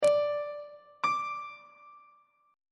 b triple sharp note; on treble clef

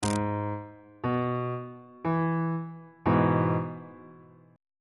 e major 1st inversion on bass clef

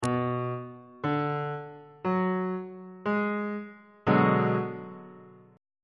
b minor 6th chord on bass clef

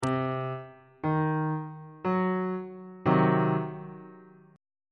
b sharp diminished chord

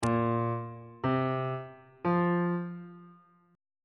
a sharp suspended 2nd triad chord intervals

